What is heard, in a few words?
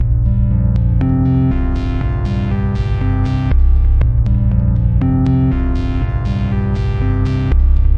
120 jumping the chord sun, MELODIC LOOPS